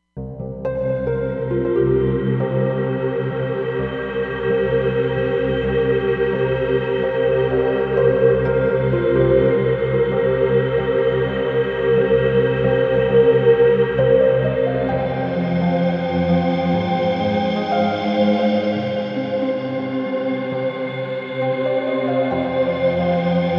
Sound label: Roland Fantom XR